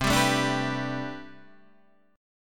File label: A Minor 11th C, Chord